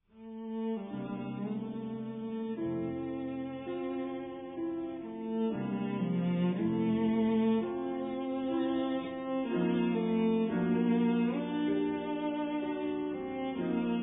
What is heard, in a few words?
cello and piano; SERGEJ RACHMANINOV; Two pieces for cello and piano